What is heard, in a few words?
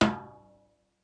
tom press